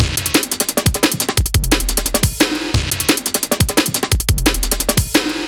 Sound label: 175, jungle breaks